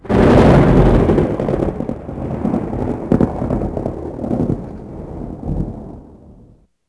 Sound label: Thunder